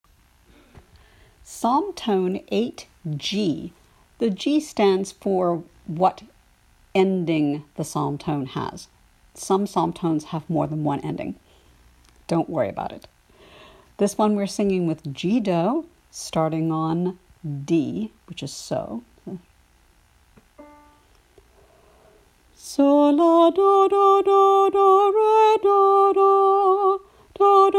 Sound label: Psalm Tone VIIIG